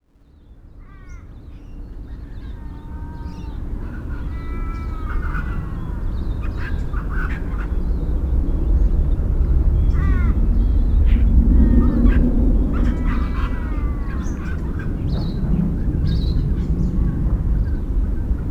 Sydney Harbor; ambience; Project Gotham Racing 2

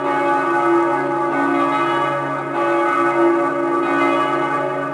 bells; Splashdown; XBOX; Soultree; Venice

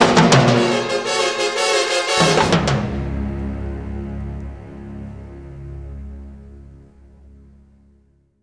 battle, music flourish

